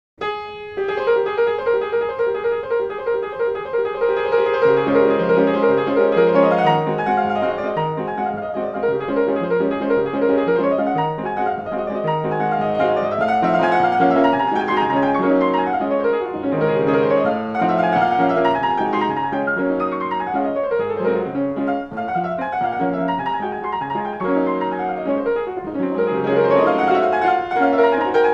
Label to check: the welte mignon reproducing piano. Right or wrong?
Right